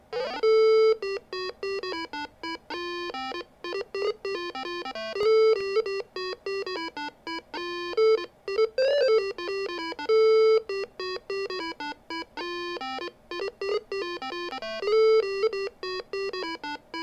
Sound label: intro speaker; PC Speaker; soundcard test; Lost Vikings; Soundcard test page